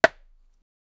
a wooden pickaxe hitting, mining

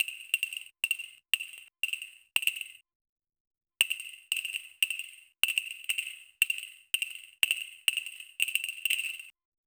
create a short repetitive, discrete

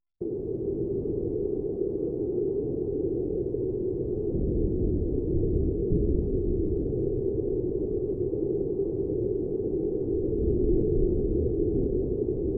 calm soft fog sound; ambiance

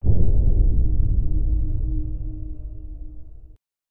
a sci fi soldier walks

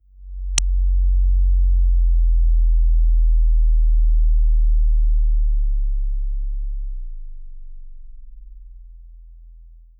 sonic logo

